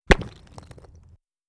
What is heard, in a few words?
shale break low end crackling rock